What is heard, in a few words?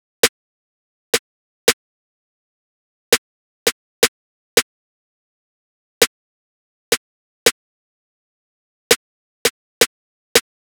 Drum Loop 166 BPM Snare, Trap; Loop Stems; Chamber